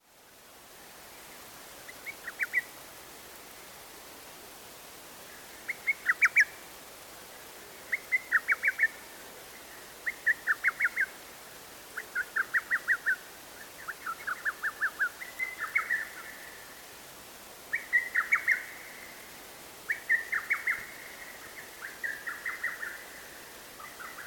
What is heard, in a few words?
hototogisu, bird